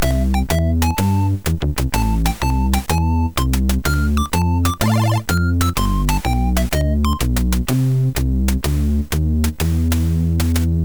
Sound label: Jazz, MOS6581; sid